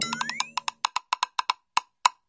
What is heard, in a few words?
clock finished; Bunnicula - Juicy Bites